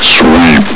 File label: sweep